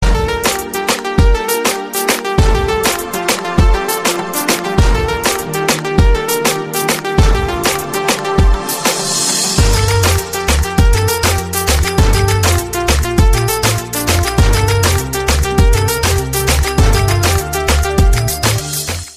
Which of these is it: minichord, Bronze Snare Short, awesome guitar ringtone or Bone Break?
awesome guitar ringtone